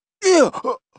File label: Man Fall; voice; Zomboid; Lifestyle